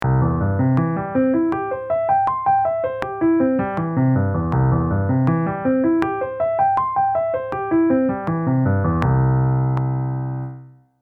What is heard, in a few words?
with metronome